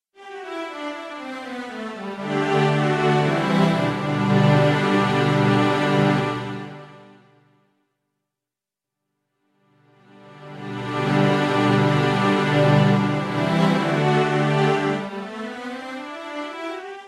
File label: strings